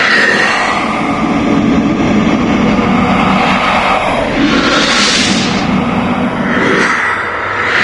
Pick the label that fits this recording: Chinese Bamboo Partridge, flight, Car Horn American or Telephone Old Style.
flight